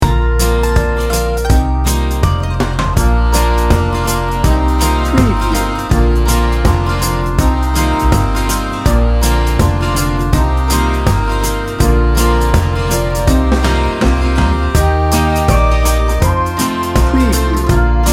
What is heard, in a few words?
americana folk pop upbeat